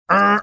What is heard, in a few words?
making buzzer like sound